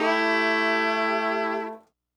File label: Horns, 70s Analog Funk; Sonic Foundry (Sony Creative Software); What it Is!